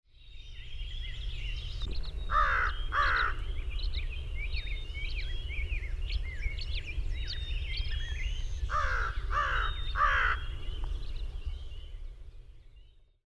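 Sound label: American Crow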